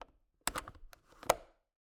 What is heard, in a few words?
Cabin, window, latch, flip, lock, open, wide; Eiravaein Works; Vaeyan IV